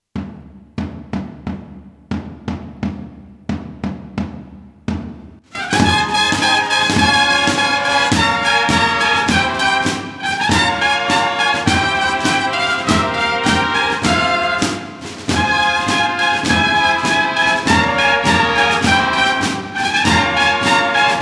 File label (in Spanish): Banda de Cornestas y Tambores; Cristo de la Vera-cruz; PilasCantaalaNavidad